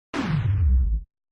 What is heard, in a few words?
Slow Motion Sound Effect Reversed; Neon Souls; PS4 PS5 switch windows xbox one xbox series xs